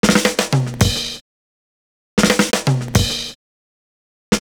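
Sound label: SNR SOUNDS, 112 bpm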